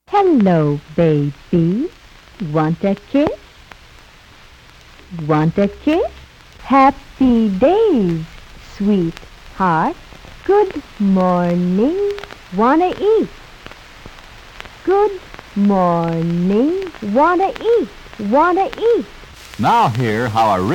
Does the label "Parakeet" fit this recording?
Yes